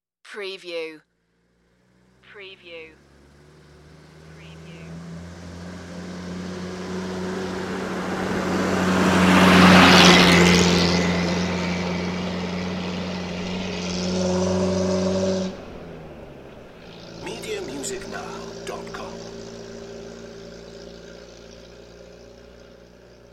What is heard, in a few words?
heavy rusty truck